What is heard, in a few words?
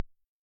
Dr Kick